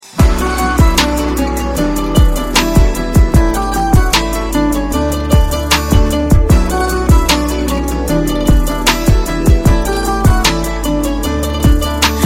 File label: Song Instrumental Ringtones